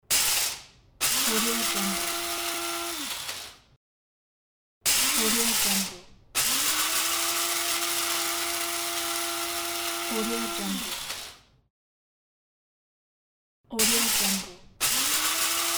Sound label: coffee grinder and grinding coffee beans